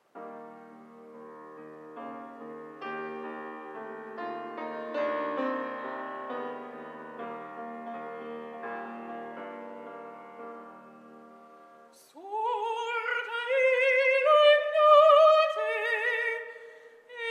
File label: [F] opera, aria, coloratura, singing; Ernani Involami - Verdi; [so many runs]; [coloratura up the wazoo]